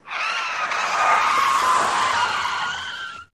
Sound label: Car Tire Skid While Br